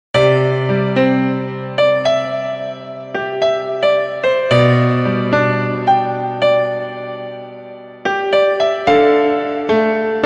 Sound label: love piano